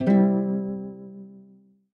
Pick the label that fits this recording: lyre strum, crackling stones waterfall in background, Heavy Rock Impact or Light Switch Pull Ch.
lyre strum